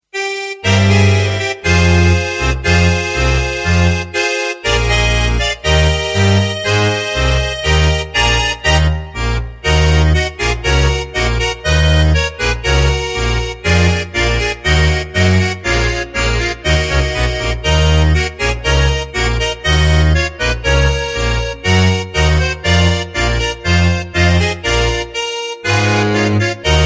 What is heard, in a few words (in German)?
Tango